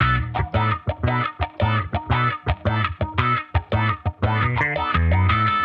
J Bass Strat Mix 85, Guitar Bass Mix; 85bpm; funk soul

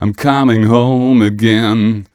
m 127 mel a, Vocals

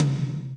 TOM TOM, Roland

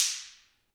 Afro Wood, Ambient Stix; Roland LCDP14 Africa VOL-2

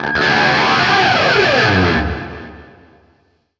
scrape, guitar; East West; Steve Stevens Guitar Samples Collection

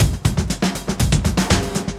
breaks, 120bpm; free bespoke breaks; BeatStyleG

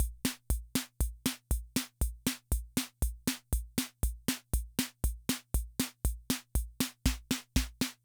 Loop, rhythm 55, classic machines

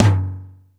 FX TOM LO; Back In Time Records; Big Bang

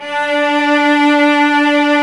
ARCO CELLI; OMI - Universe of Sounds

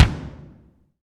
BD, DR BD; Best Service Gigapack 2

